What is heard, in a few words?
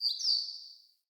Bird Double Chirp; Galene Bird